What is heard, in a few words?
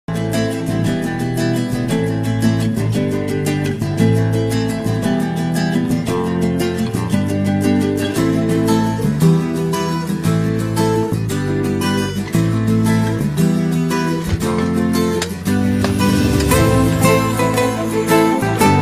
bgm ringtones; colour photo